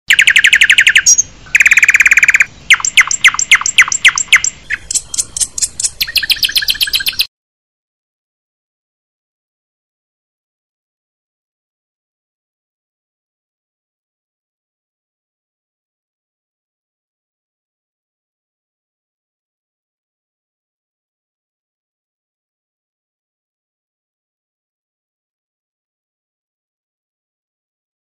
audio masteran untuk murai dan kacer; versi panjang

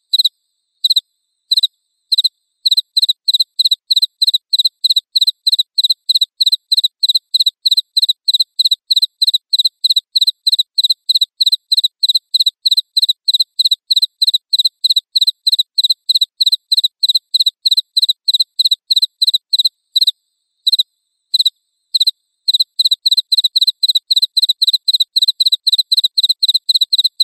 jangkrik

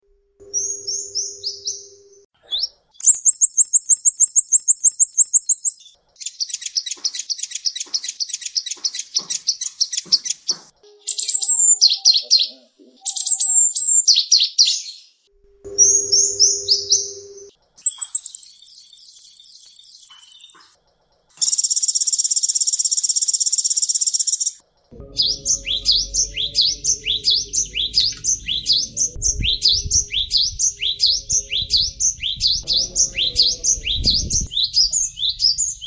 kombinasi kolibri glawing

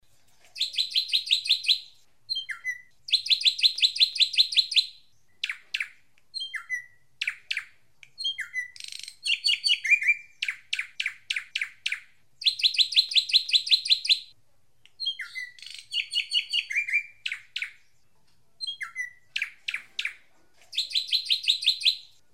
suara masteran srigunting jambul rambut

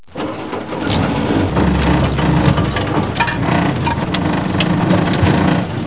suara mobil

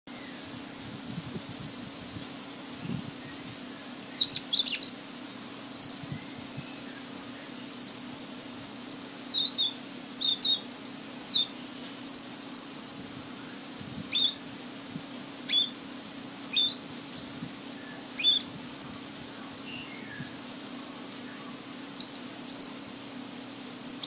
cucak rante mas

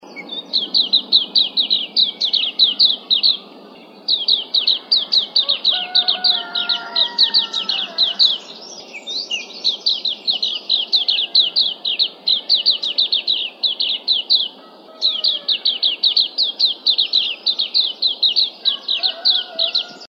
suara kacamata arfak

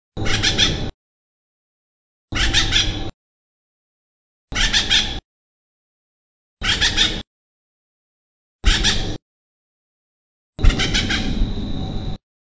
burung pelatuk acorn suara kicauan

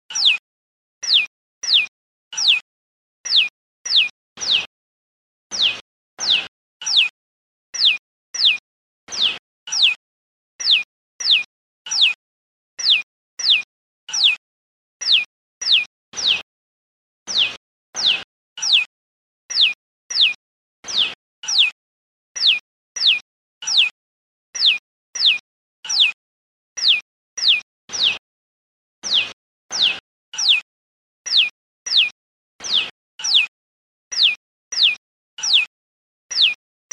suara prenjak lumut betina

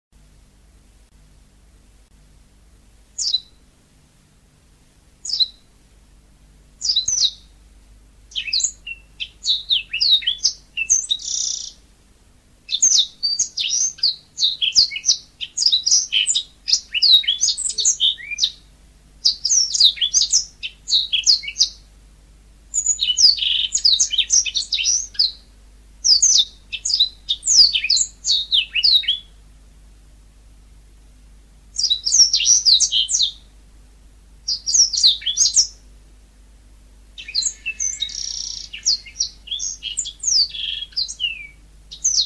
mozambik

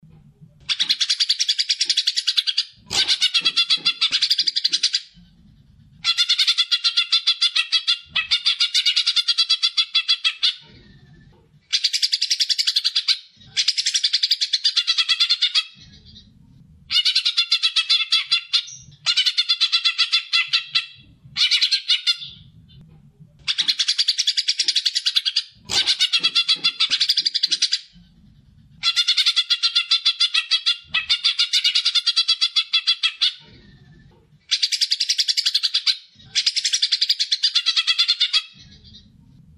cucak hijau isian gereja

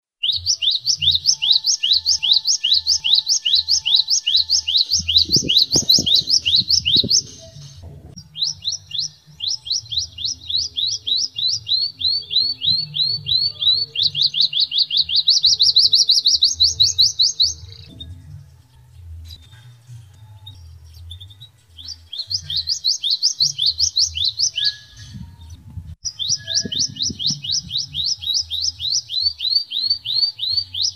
suara kenari isian glawing

